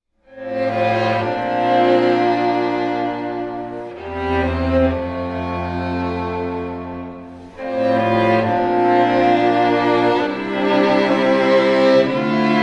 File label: Strings